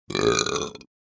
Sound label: Male burp strong and throaty